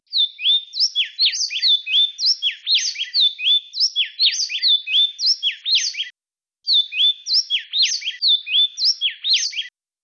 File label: duet stereo